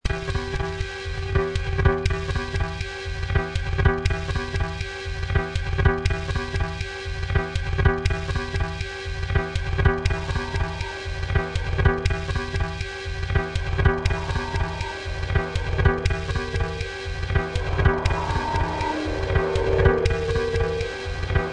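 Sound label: Beat Resonated Compressed Stuttered With Backward Toy Piano Harmony EQed Full Song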